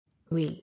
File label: Wail